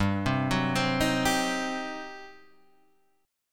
G Major 7th Suspended 4th Sharp 5th, G, down, Guitar, Standard; GM7sus4#5 arpeggiated